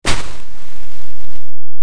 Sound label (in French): pas chro sable; Time Machine; Bruitages